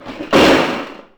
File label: CRASHING, Anime Sound FX; COMIC